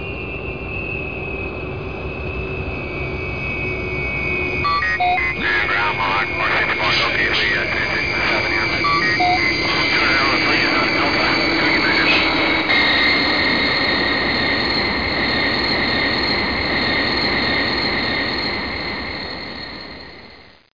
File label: cockpit; toto